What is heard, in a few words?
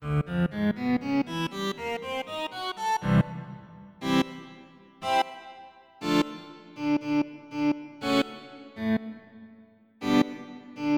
Attacking Gtr